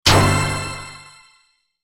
Character Level up

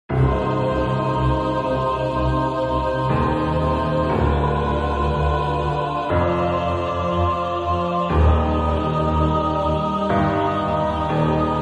vampire

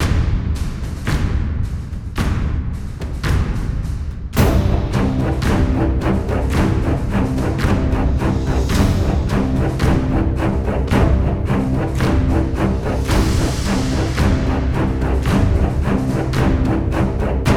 110bpm, LONG LOOP, Epic Combat Music; 1min44s; LogLog Games; Epic Combat Music Pack

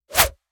arrow swish

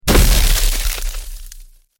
large ice rock crash

